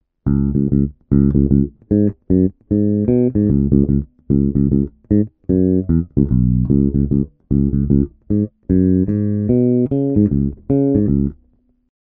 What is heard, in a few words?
spector no eq